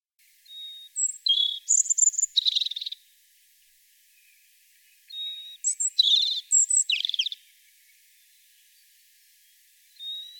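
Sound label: zaryanka golos, European robin voice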